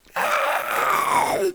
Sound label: idle, zombie; DayZ-Epoch; dayz sfx